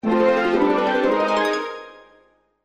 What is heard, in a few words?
Reminder; Blue; Sony Ericsson; P900